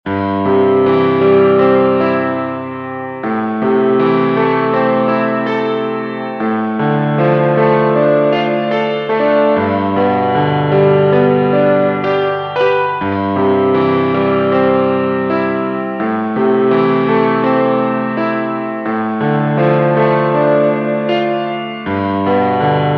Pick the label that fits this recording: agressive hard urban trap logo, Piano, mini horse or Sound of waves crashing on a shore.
Piano